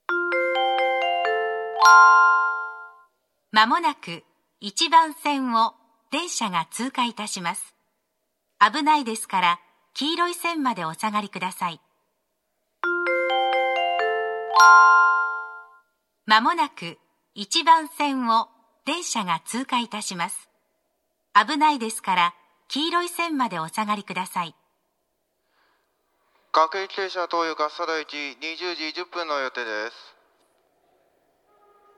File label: pass, station; funabashi-nichidaimae